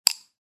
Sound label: Small sized thin wood panel hit